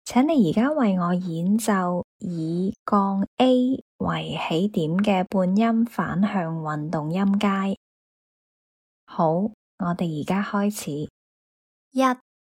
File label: chrom cont mot scale starting A FLAT